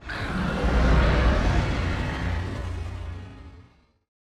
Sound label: dinosaur roars long; SoundsCrate